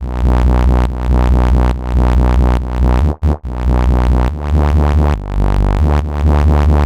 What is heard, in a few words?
140 B BASSLINE, Dubstep